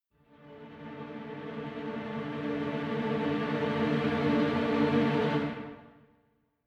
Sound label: Combat; The Witcher 3 Wild Hunt; Skellige; Combat In